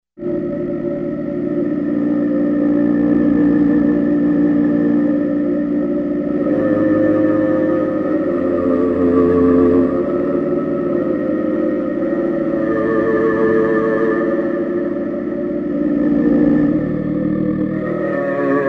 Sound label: Throaty Vox